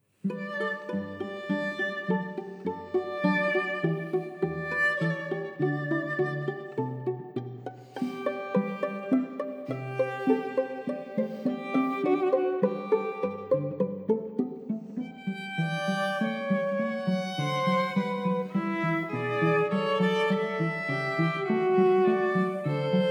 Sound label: Variation pizz